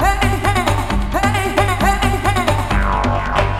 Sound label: MOVE LOOP; Techno Trance; E-MU Formula 4000 Series Vol. 2